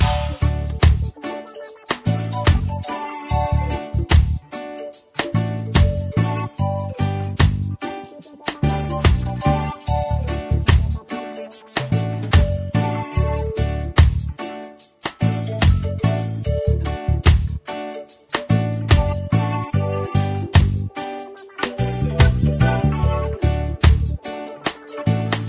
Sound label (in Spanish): barco reggae